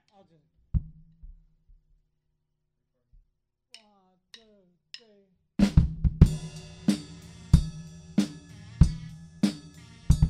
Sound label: beat; bigfalls basement